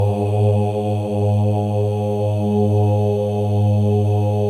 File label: MALE OHS; Spectrasonics; Symphony of Voices